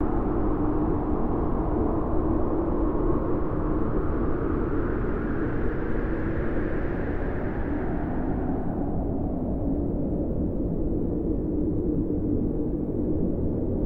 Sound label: wind